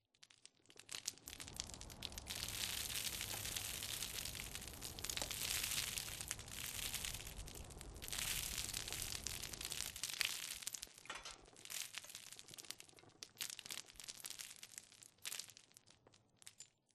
Rock Debris Small Long; Boomtown; Titanfall 2

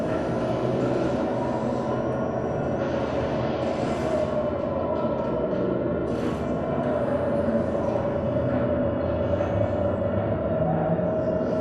Robot Arm Track Fast Dist; Boomtown; Titanfall 2

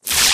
imp bullet energy bubbleshield; Titanfall 2

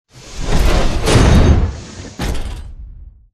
melee backhand low; Titanfall 2

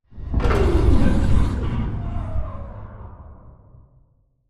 Dome Power Off; Titanfall 2; Boomtown

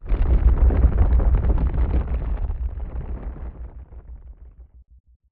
Explosion Rumble Exterior Close LFE; Boomtown; Titanfall 2

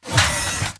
Mvmt Servo Light Medium; AI Stalker; Titanfall 2

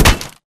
bullet light ballistic spectre; Titanfall 2

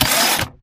Mvmt Servo Head pivot Short; AI Stalker; Titanfall 2

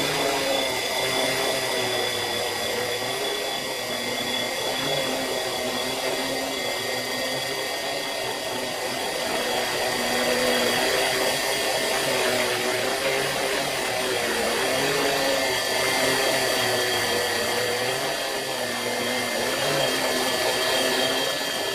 Drone Hover Close; Titanfall 2

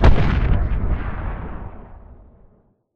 rocket explosion far; Titanfall 2

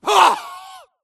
grunt death neck; Titanfall 2

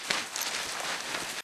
wounded drag; Titanfall 2

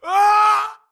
grunt death crush; Titanfall 2

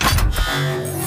Servo Jog; Titanfall 2

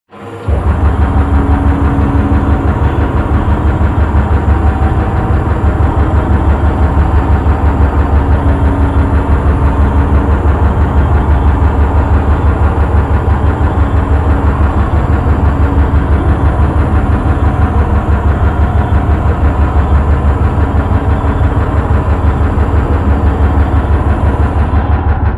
Prop Warehouse Cargo Elevator Down Move; Titanfall 2; Boomtown

